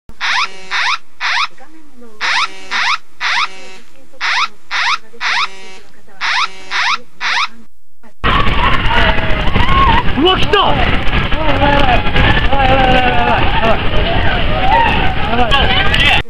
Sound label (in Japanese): kinkyu jishin sokuhou